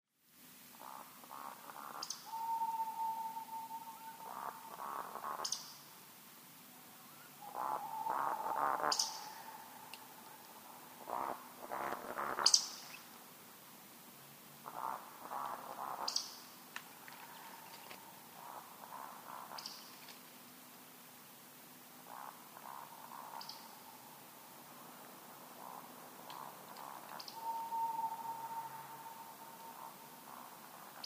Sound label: Eurasian Woodcock Scolopax rusticola; fekete istvan tanosveny